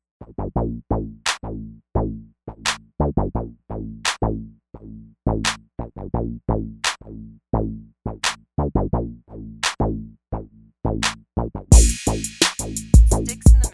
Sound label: Basshouse